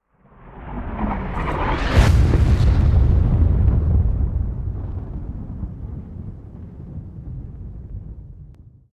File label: Incoming missile large explosion